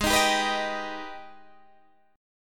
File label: G sharp Minor Major 7th G sharp down Guitar Open D